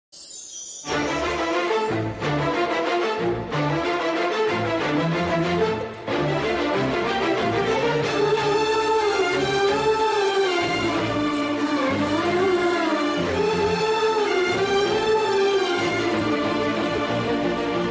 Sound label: emotional bgm mobile ringtone telugu ringtones; arya movie